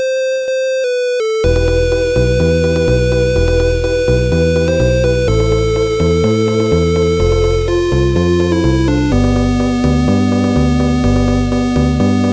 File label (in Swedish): liten tango; MusicLine